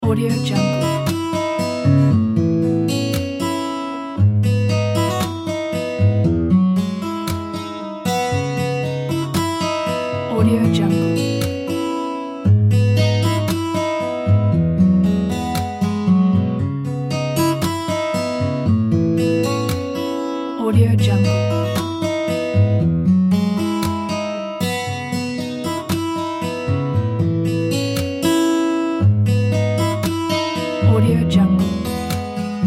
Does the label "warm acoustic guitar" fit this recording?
Yes